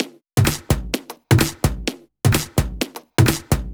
Loop, 128 BPM

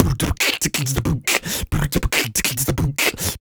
Human Beat Box 140 Pop; Loopmasters

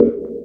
Synth Shot D#; Mutekki Media; Vengeance Electroshock Vol.2

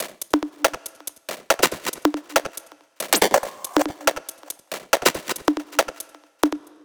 Bpm140 Fx Loop, Dubstep; Anod

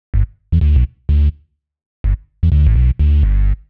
126 G D BASS, Funky Underground bass loops; Loopmasters; Funky Underground Vol 2

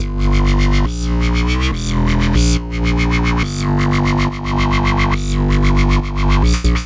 140 G BASSLINE, Dubstep; Loopmasters; The New Sound Of Dubstep; BASS LOOPS

